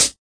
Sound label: hat; Hats